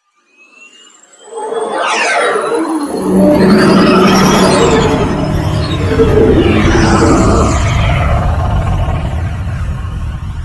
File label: Insect Liset Relay Docking, Vehicles Flight